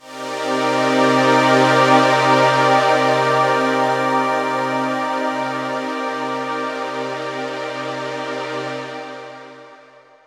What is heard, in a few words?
pad wavestation strings cmin9; M8; M8JUNGLEPAK1